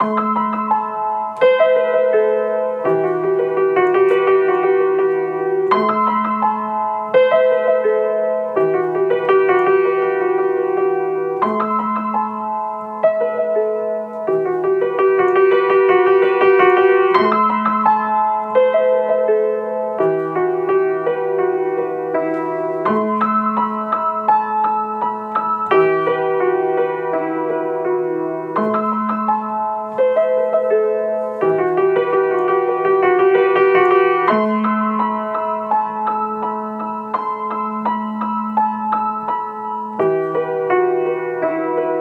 84 BPM D# Min, Piano; Moscow; Cymatics; Bloom Piano Compositions

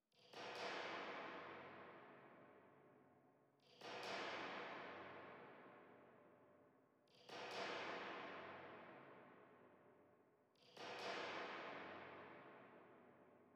138 BPM C Min ARP2600 FX; Loops; Loop Stems; Cymatics; Fantasy Synth Sample Pack; Afterlife

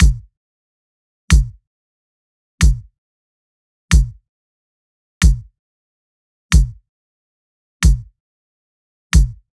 Drum loop - 95 BPM Kick, Latin; Loop Stems; Cymatics; GHOST (Beta Pack); Astro Drum Loop

